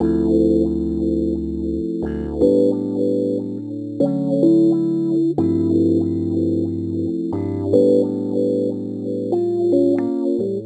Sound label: Rhodes Attacks; Ueberschall